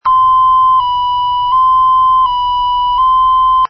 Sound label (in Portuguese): FRANCES (1440-1880Hz); 27C10